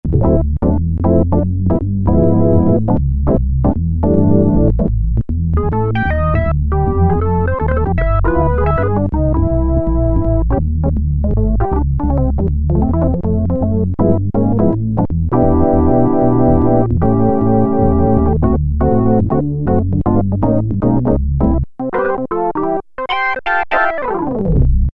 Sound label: Organ I, Roland Juno-106; Factory Preset